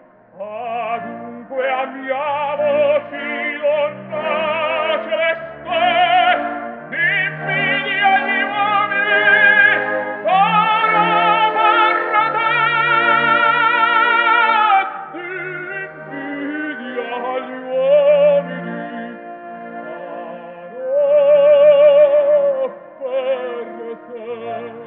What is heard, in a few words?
music opera extended